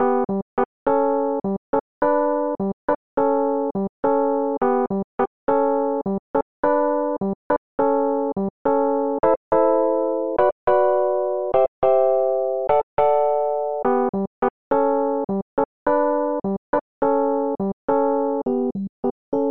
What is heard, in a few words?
solo piano; creating an epiano